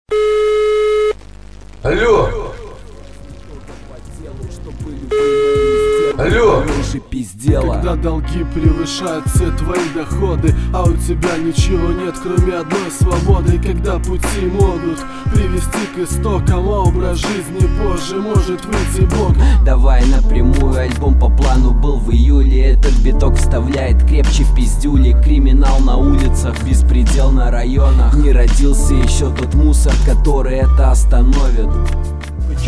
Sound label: rap